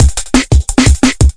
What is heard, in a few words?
174 bright world jungle; 161 to 180 bpm